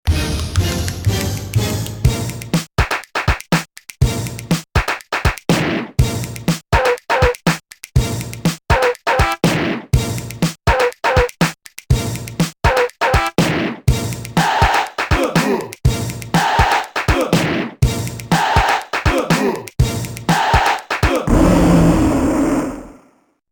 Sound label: TOM Special Effects